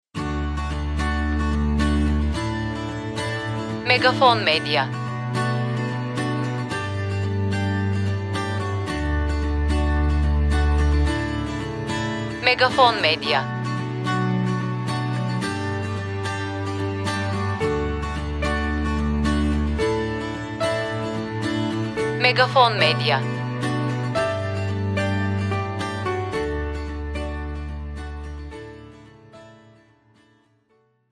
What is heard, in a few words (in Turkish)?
SANTRAL MÜZİK